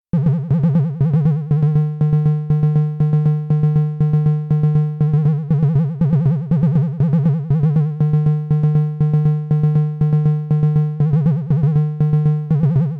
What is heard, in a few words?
Vibrato